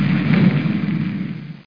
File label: explode-quiet; Vitamin